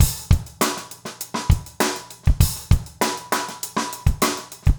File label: basic funk 100